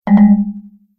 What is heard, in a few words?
Message Error, beep